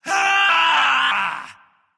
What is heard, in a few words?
battlecry; smite; voicepack; janus